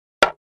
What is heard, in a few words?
2x4 Impact, hits